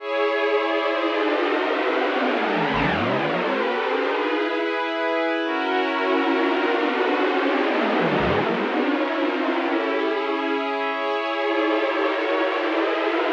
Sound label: Expand Contract Pads